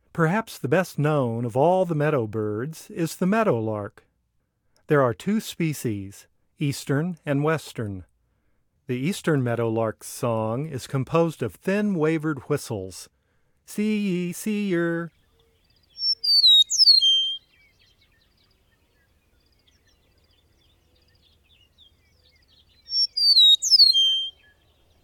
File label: Eastern Meadowlark; North America; Common Western Species; Know Your Bird Sounds